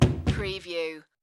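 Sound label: Cabinet Door Shut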